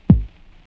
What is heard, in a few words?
kicks